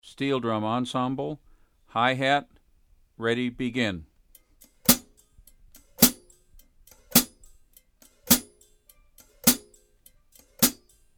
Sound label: Hi hat